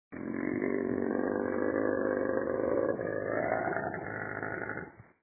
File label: Growl, wolves